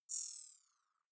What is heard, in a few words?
zoom out light, signale; kommunikation